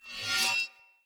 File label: Short Sword Whoosh Accent Heavy; Weapons; Player; Swords; Sollos